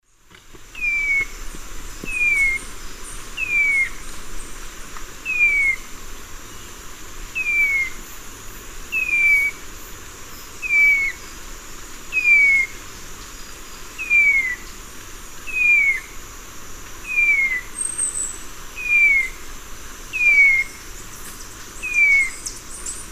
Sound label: Vireolanius leucotis, song; S. America; Eastern Lowlands